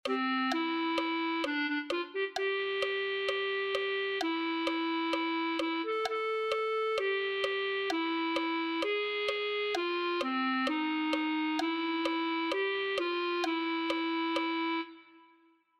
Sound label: clarinet